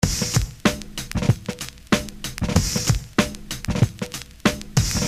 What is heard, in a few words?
beat